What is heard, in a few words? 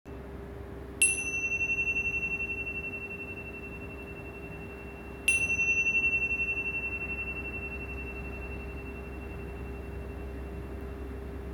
tone mantra